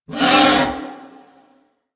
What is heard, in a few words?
Ram Pain; Animals; DE; Warframe; Quests; Duviri